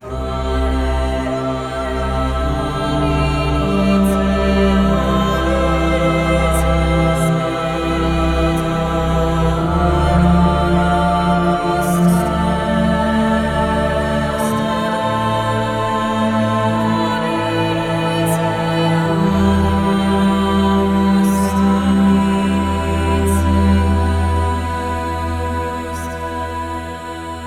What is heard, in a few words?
Hallelujah Emotional Choir and Strings